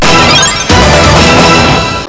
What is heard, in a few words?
jingle